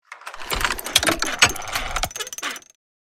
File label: Safe lock vault opening mechanism